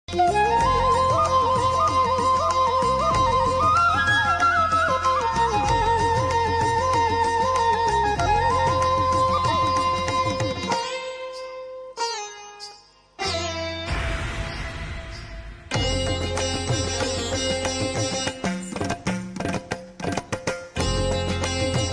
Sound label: flute bgm; Zee Sa Re Ga Ma Pa; Chandramukhi